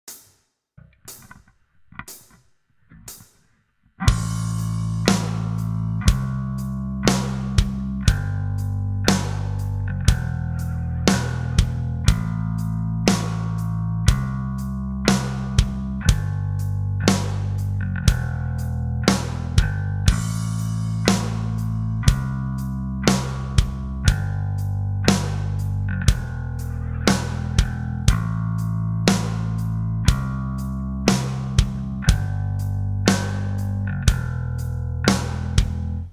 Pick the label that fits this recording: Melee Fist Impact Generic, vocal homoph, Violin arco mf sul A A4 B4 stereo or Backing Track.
Backing Track